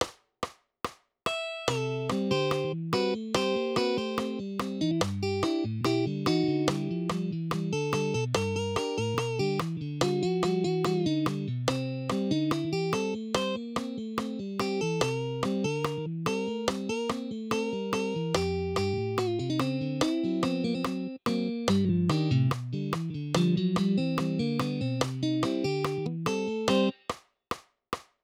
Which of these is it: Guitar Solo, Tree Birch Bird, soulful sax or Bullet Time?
Guitar Solo